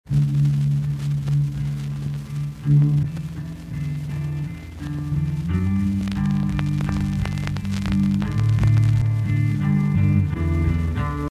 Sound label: sony, vinyl; freeware vinyl to cd de-clicker